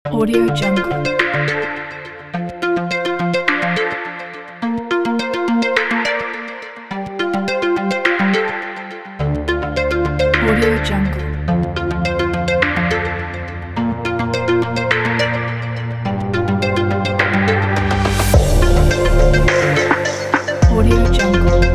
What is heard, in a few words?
innovative tech